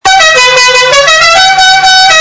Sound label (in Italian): clacson